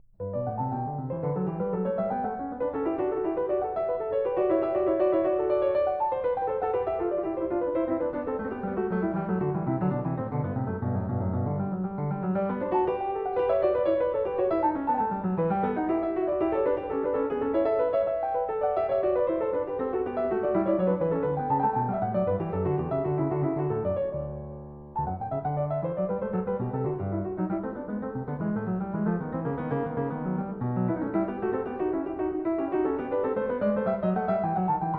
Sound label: Clav. (Binaural); Bach